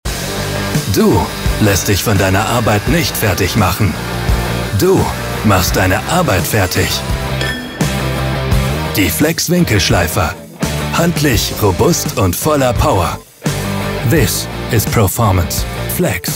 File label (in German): FLEX Winkelschleifer